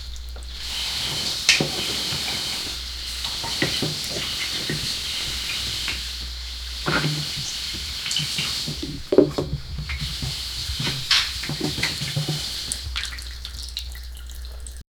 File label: water sloshes gently as, bathroom